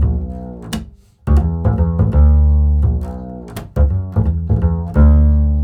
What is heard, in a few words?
acid B, 85 acid swing, bass; Spectrasonics; Bass Legends